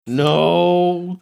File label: drawn out groaning no